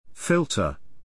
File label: filter